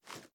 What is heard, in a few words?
reload xmag arm; gmod